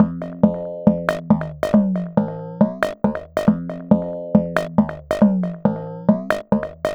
Electronic Percussion

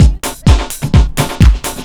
LOOP; SoundFactory; DRUMLOOP

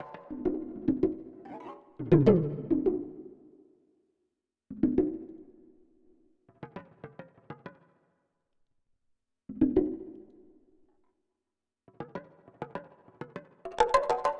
Electronic Percussion

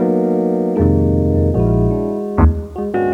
Electric Piano, Loops, Keys

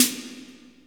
Snare One Shot, Drums

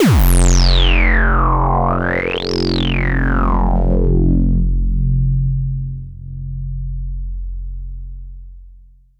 Synth Bass; Basses

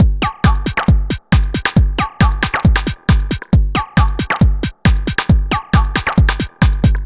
Dance Drumloop; Drums; Dance Beats

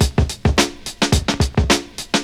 Old School Break, Drums

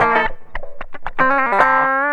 Electric Guitar, Loops; Guitars

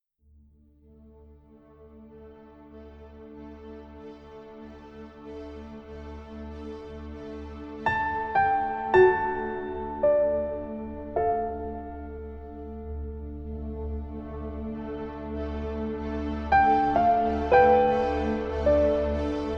Piano; The Killing (Forbrydelsen)

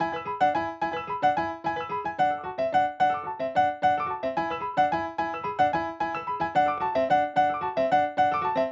Acoustic Piano

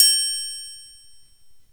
TRIANGL; roland2

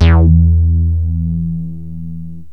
mg bass, yamaha rm1x synth bass and lead

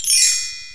BELL TREE; roland2

